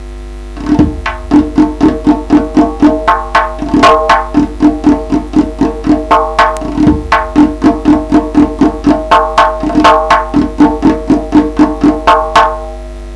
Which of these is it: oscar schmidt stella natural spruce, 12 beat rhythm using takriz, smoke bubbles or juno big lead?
12 beat rhythm using takriz